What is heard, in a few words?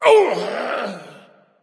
grunt hit; smite; ahpuch